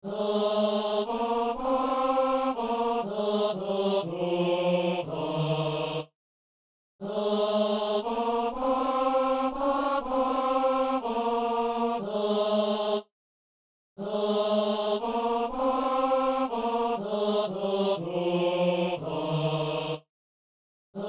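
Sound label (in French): psaume, ténor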